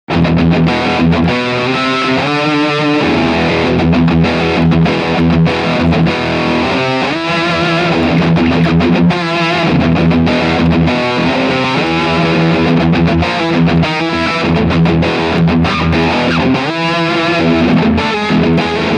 Riff Steg Overdrive

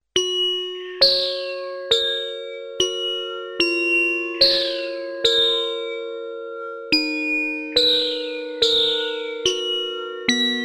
P600 Noise LFO auf einem Osc; gligli

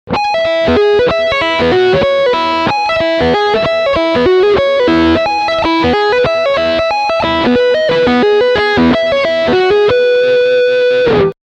Humbucker Front aktiv

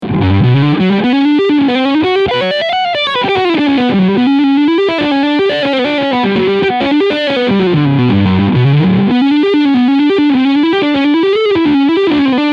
Overdrive beide PUs

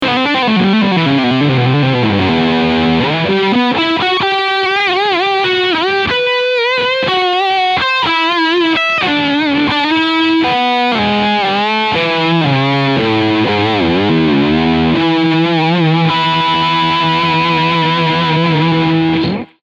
Leadsound Steg PU

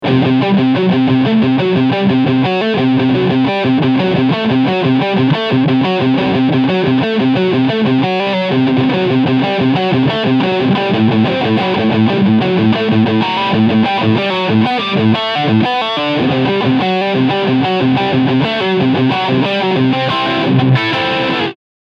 Framus Riff Overdrive Steg Humbucker; Artist Line Devin Stormbender

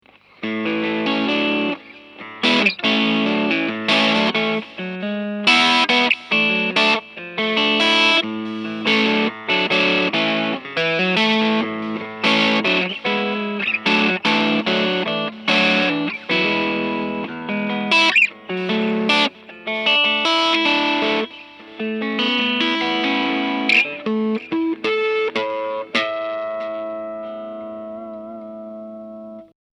Ibanez Tele Mitte OCD

